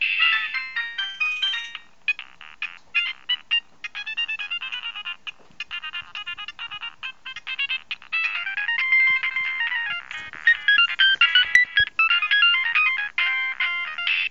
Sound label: nokia3510i in the groove ringtone